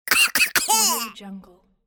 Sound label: cartoon cough